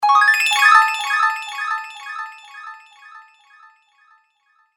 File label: Magical Mystery Sparkle; fantasy sound effect; Magic Sound Effects